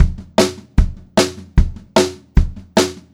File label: 152 SPEED TOM, Pop-Rock Drumloops; USB Soundscan vol.37; AKAI; 90 s Sample CDs